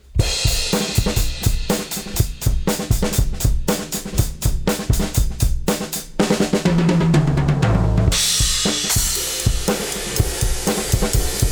Bus Processor THRESHOLD 25.0 dB MAKE UP GAIN 8.00 dB SATURATION 5.00